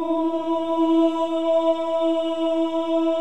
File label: CHOIR OOS, E4; Spectrasonics; Symphony of Voices